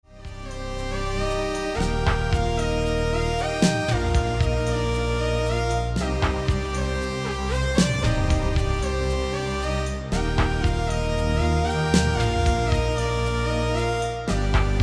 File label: Arp Quadra Bass Strings Lead